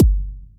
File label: hard techno kick